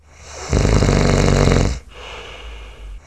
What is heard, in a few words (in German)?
schnarchen; AKAI S-Series CD-ROM Sound Library VOL-6; HUMAN SE